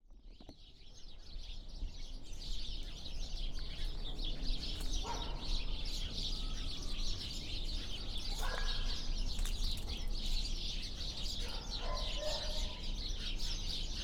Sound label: BIRDS; soundscapes cdmx